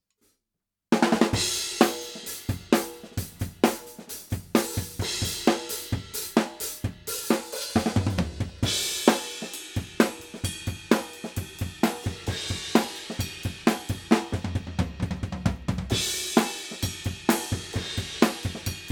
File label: Fredenstein F200 Drums